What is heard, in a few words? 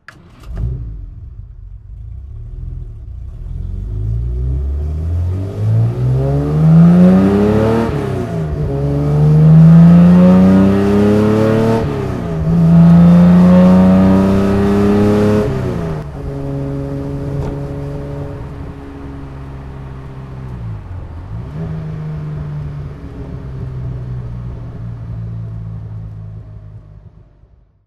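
jaguar C type drive, Engine sound driving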